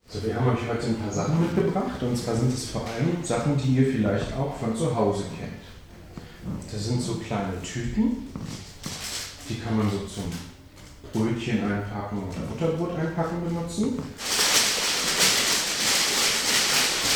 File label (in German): Experimentelles Musizieren in der Kita, Die Kleinen